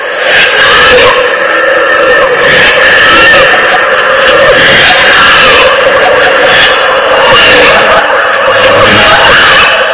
breath

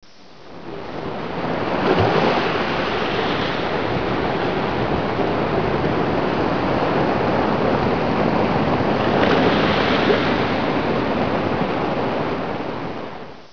ocean edge

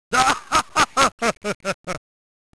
laugh

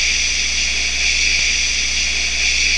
pressure

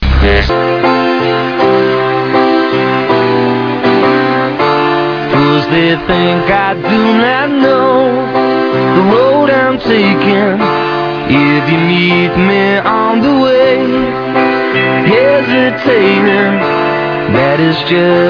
end music

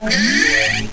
regenerate

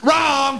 wrong

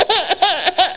baby cry

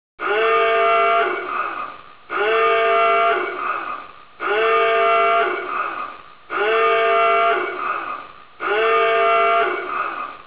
klaxon loop

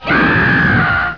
scream

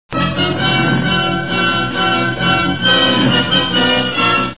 fight music

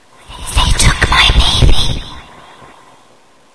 baby

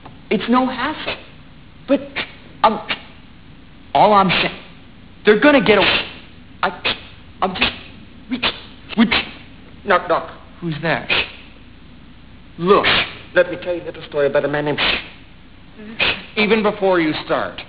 shh

